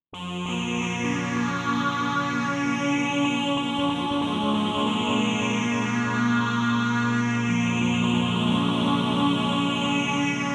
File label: Juno60 test